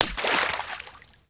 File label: SPLASH